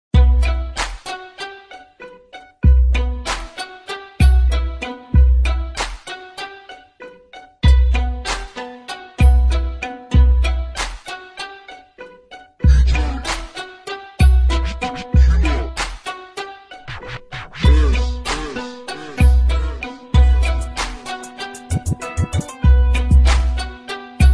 hip hop beat slow beat; fruity loops

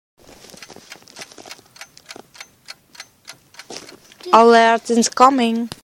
clock message

